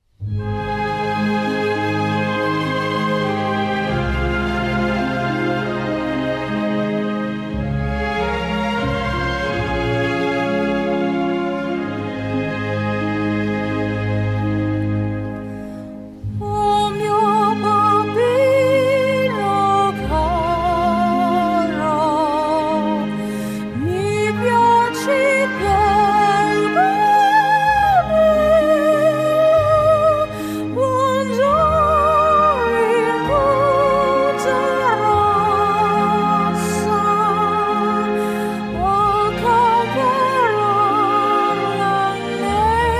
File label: Opera, Untrained alto trying to be a soprano; Gianni Schicci; Puccini